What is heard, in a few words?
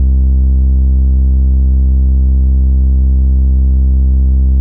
ir Flux Down A; molecules and light; greenhouse effect